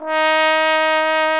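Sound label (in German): POSAUNE VIBRATO; Best Service; Alpine Volksmusik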